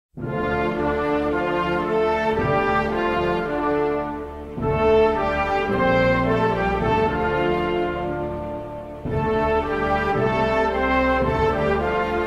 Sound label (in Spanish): Himno Nacional de Bután